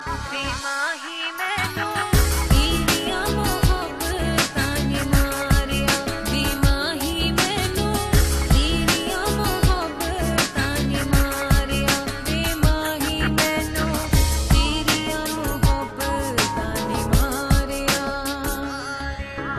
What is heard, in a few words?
sad punjabi tone; country